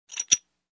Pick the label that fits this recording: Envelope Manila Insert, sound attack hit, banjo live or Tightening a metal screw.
Tightening a metal screw